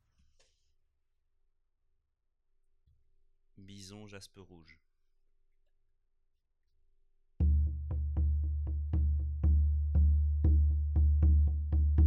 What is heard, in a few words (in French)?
bison jaspe rouge; bison 45 cm hetre jaspe rouge